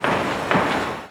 construction; Hearts of Iron IV Death or Dishonor

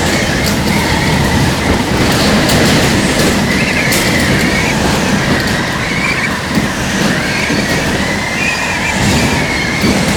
whistle debris loop; ambient, ambience, rainscapes, rain; Left 4 Dead 2

